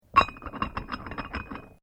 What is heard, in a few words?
Weights Dumbell Add Screw, Gym weight lifting equipment; Sports